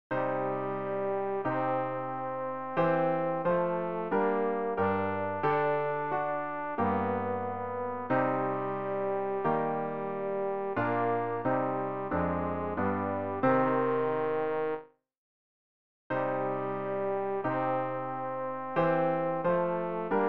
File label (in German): tenor; Ref. Gesangbuch; RG 616: Gott der Tage